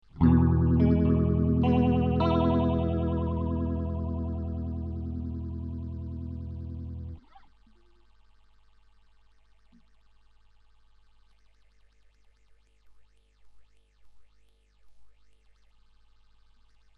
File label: jet phazer phase clicks